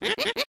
Chuckle; lethal company; ambience; windows

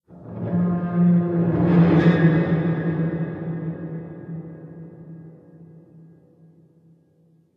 Metal Distant Groan

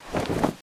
Swish Clothing Rustle

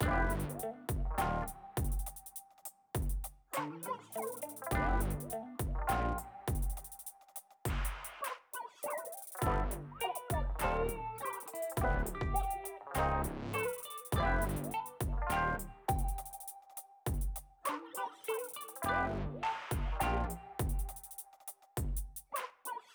BGM Plaza Gear Shop

